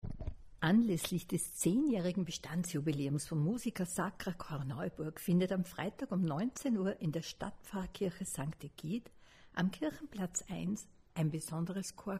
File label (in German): Chorkonzert; 10 Jahre Musica Sacra Korneuburg